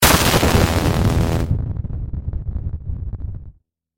shockwave

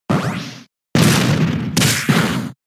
body slam

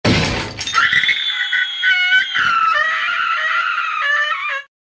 pink gummy bear scream

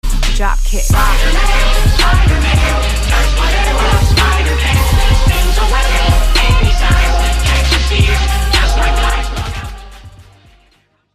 ninja music

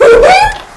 hehe

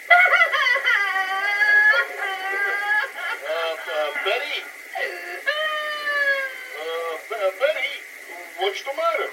sobbing crying; betty rubbles

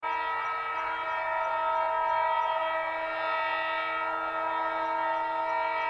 honk

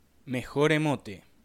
emote

